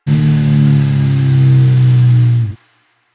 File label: Engine Disengage, Motorcycle; Motorcycle Ninety Nine